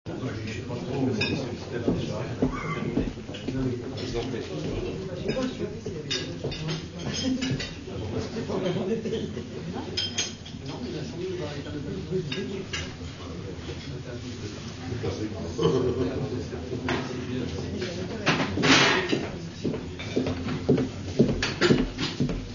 ambiance resto marché salle

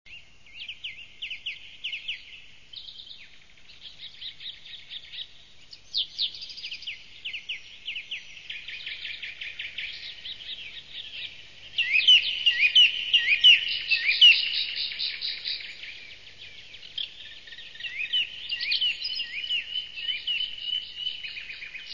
nature oiseaux

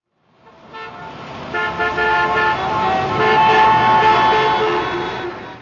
auto trafic

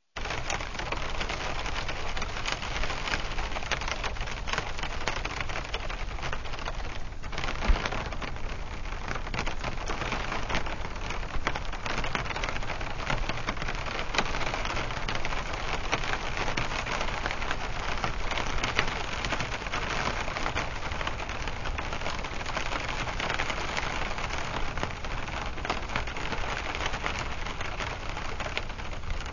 ambiance pluie

